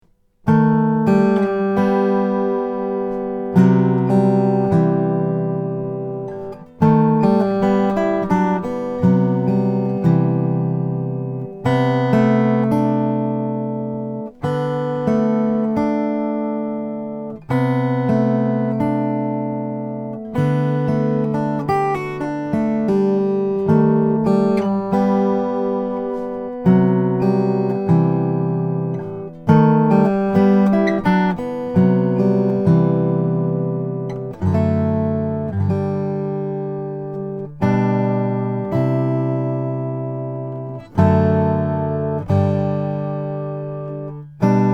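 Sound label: rainsong apse sunburst graphite, guitars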